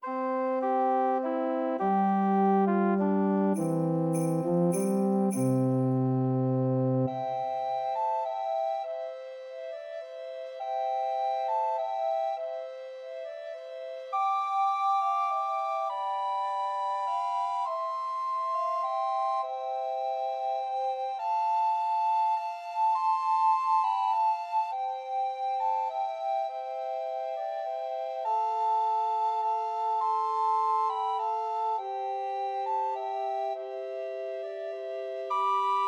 Christmas Trios for Two Descant and One Treble Recorder; Silent Night